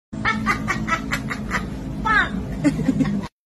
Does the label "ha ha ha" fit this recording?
Yes